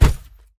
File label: enemy death land hip